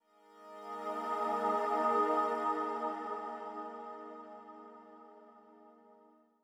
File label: Calm Pad; Super Mario Maker 2